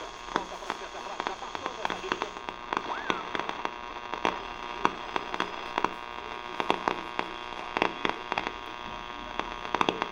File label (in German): Radio Knistern; Fieldrecordings; Brasilien